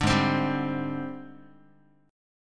Ab Major 7th Flat 5th Ab, down, Guitar; AbM7b5 strummed

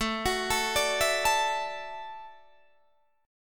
D-Major Add 9th A down Guitar Standard; arpeggiated; JGuitar

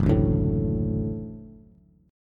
Gb Minor Sharp 5th Gb Bass; strummed; JGuitar

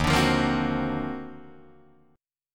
Eb Minor Major 13th, Guitar, DADGAD; EbmM13; strummed

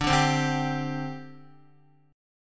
D 7th Suspended 4th, down, Guitar; strummed; JGuitar

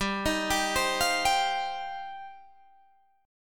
G 7th Suspended 4th Sharp 5th, Guitar DADGAD

